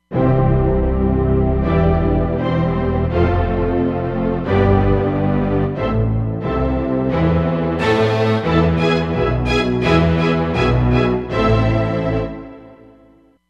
Biggie Bows, Roland Fantom XR

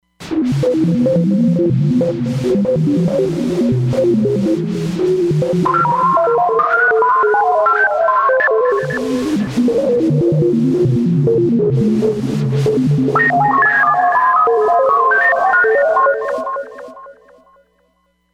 Mod Scanner, Roland Fantom XR

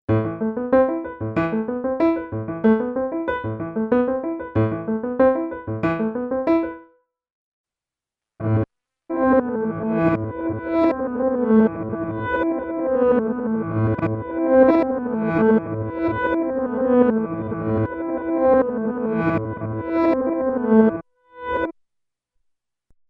Reverse Shift

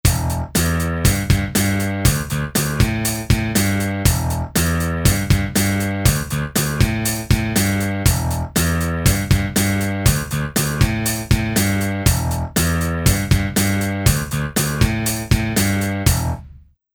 Rock

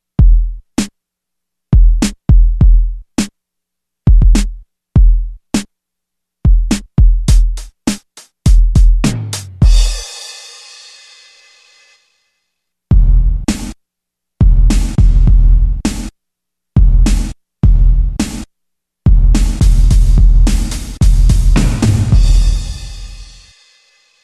REVERB & GATE, Yamaha REX50

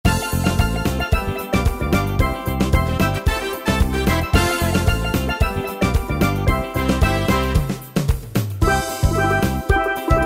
Calypso, Yamaha PSR-3000 latin style; Factory Styles

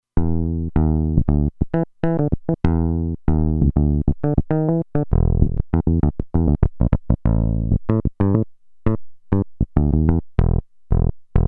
E Bass, Roland Alpha Juno-2; Factory 2

